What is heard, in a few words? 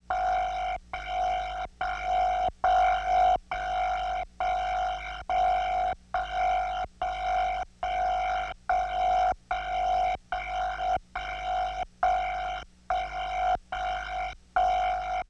Classic Alarm, Electronics; Sony Pictures Sound Effects Series Volumes 1-10; Sony Creative Software